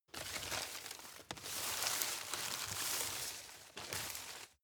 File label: grab crunch long medium